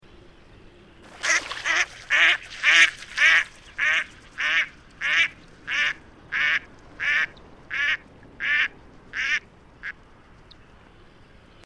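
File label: mallard sounds; glazchatoi kriakvy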